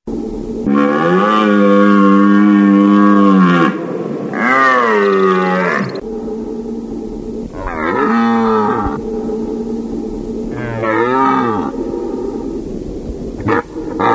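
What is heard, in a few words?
olen golos, deer voice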